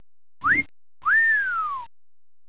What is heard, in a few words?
Wolf Whistle